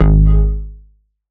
bass one shot G

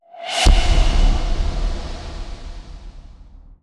fx impact

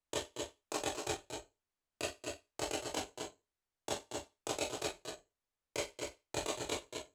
Background Loop 128

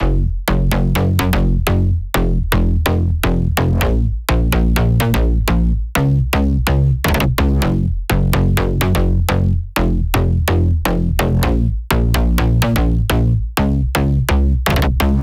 Drop Bass 126 A m Dry

